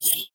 Futuristic Weapons Sound Effects

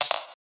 item coins, Magic And Spell Sound Effects

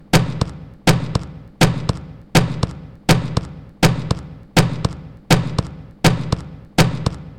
gunshot; Fire Weapons Sound Effects

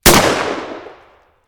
20 gauge shotgun gunshot; Fire Weapons Sound Effects

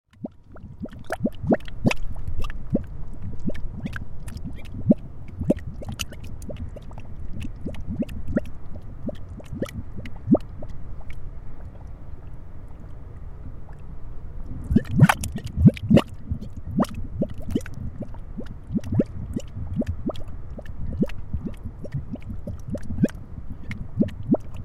mud volcanos salton sea, Earth Sound Effects; Earth Sounds

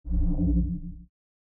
space shield sounds

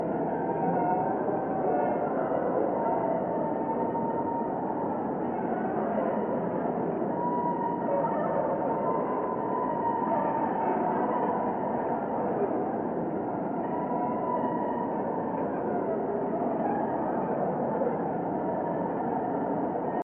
atmos eerie, magic and spell sound effects

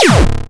retro laser gun shot; Retro Game Weapons Sound Effects

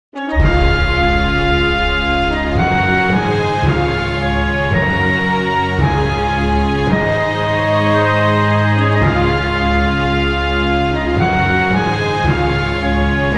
fanfare slow version, Classical

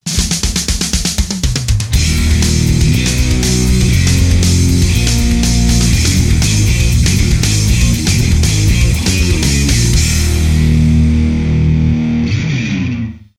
Rock Intro

